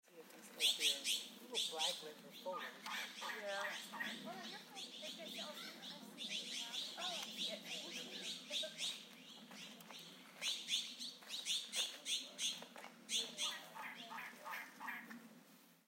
Elegant Trogon Jays

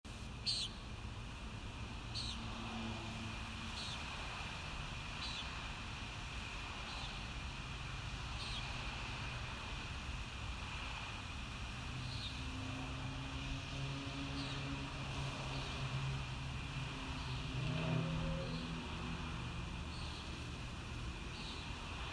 Common Nighthawk Kissimmee Noise

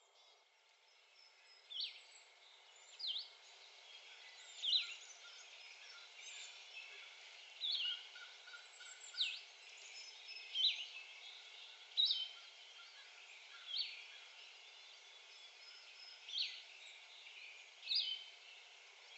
Blue-headed Vireo

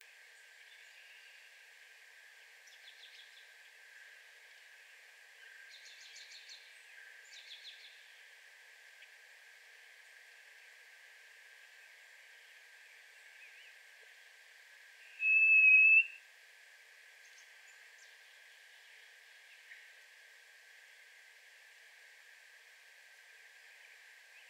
Harriss Sparrow singing in my yard

